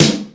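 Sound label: FM snare drum; East West; Global Sounds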